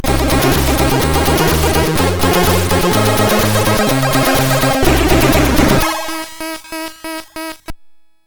Game Music, sid MOS6581R2

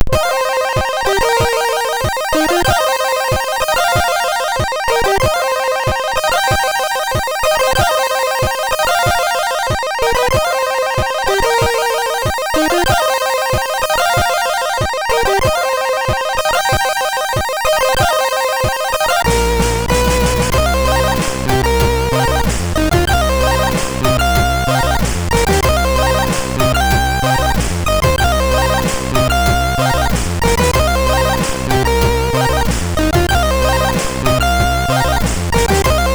test tune, sid MOS6581R3

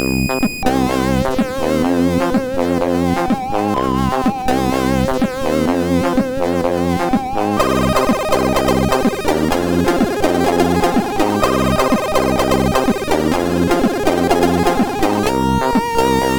Fem Laaten, MOS6581; HCL; hvsc

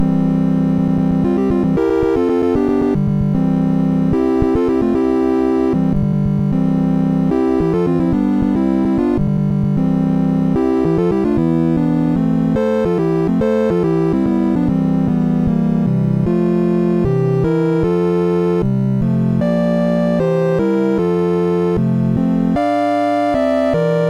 sid MOS6581R3, GAMES; Flak

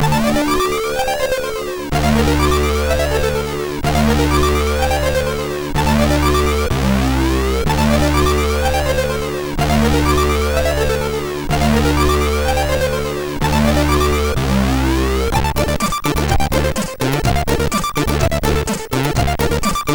New Idea, MOS6581R3

